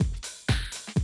125, Classic Drum Loops

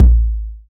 BD, BD Dance; Sound & Vision; Gigapack I CD 1

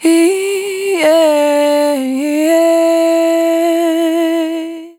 Single Voice Melodic Loops, Vocal, Dry

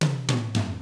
Double Platinum Drums, R N B 6-8; ILIO; Double Platinum Drums 1

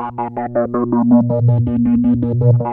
3100 DP A#3, Korg PS3100; Back In Time Records; Korg Universe VOL-1

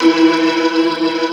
E3, Keyboards Old School; USB Soundscan vol.09; AKAI; BIG HAMM

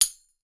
CHILDS, TAMBOURINES; Voice Crystal - Steve Reid Definitive Percussion

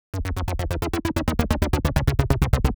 reason bass; Synths and FX; neuro; Sinistarr

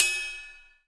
RD, Casio CTK-5000

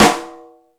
Rock N Roll, Drum Machines

